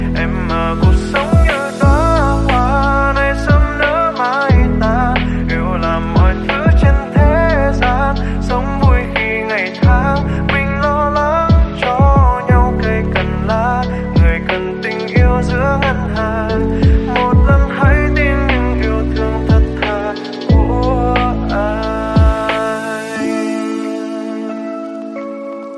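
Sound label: ringtone lofi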